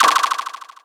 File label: Percussion, 140 BPM; ANOMALY; Plasma